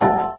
asterisk, windows 9x sound schemes; the golden era

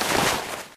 weapon inspect movement; tf

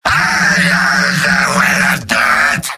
battlecry; demoman; video games; source engine; tf; mvm

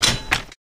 moped start shake; video games; player; taunt; source engine; tf

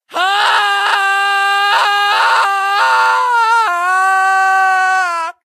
falling; medic; source engine; tf

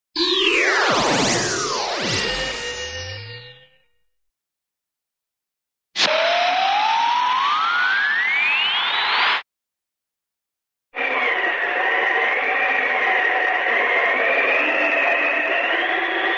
sci fi wipe; cartoon; superfriends

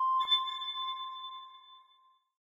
node activate; ui

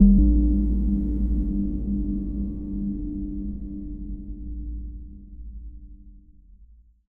editor blips; Source Engine; Portal 2

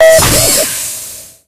sphere socket eject; Source Engine; Portal 2

